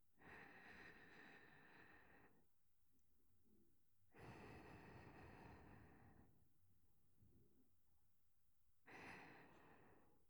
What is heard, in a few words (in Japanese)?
寝息 ループ 用 (左), ASMR